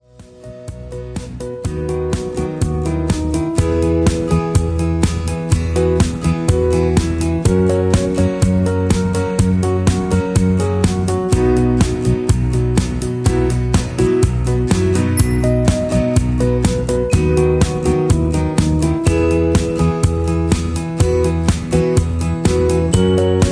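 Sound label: Instrumental with background vocals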